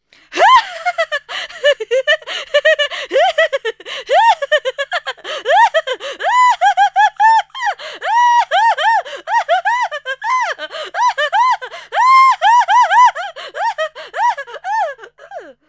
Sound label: Insane Witch Giggling; Halloween Sound Effects